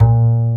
BASS, Drum & Bass, 140 BPM; Ueberschall; Drum & Bass Flash Trackz

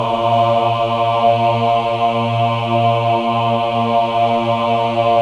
MEN AH, Choir of Ahs; Roland - LA Composer vol. 1

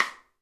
SD STK; Sonic Reality; Interactive Drum Kits

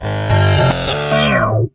SQUEEZ DIVE, Funk Guitar; 90 s Sample CDs; Zero G

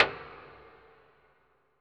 HIT NOISE; AKAI S-Series CD-ROM Sound Library VOL-5

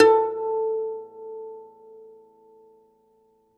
DOMRA, Plucked Strings, Segundo Domra

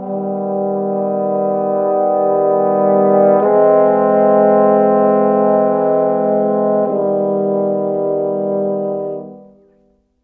Horns, Cinematic; Sonic Foundry (Sony Creative Software); Orchestral Series VOL-3 - Cinematic

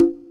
TR kit, techno kit; Pro-Rec - Synthsonic

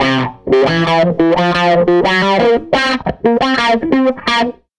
Tele Guitar Loop

